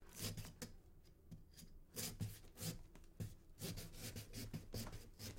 carving; jibo

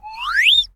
ascending; jibo

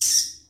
bat squeak; jibo

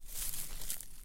leaves rustle; jibo

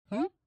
speak cue; jibo

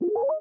Bleep; jibo

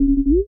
touch off; jibo

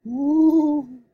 ghost ooo; jibo

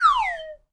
sfx descending; jibo

